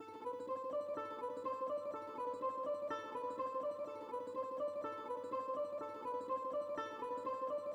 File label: music interactive; World of Tanks